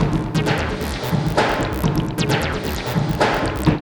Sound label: LOOP, Tekno Industrial; East West